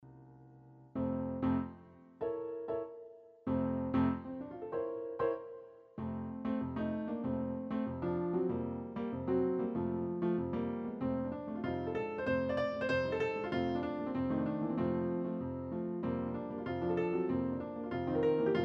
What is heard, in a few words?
Piano Concerto 1st Movement; Gershwin